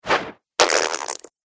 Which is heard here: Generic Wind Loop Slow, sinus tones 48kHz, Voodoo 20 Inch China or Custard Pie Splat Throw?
Custard Pie Splat Throw